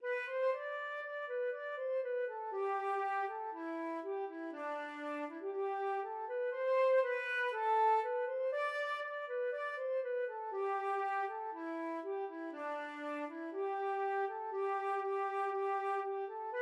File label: flute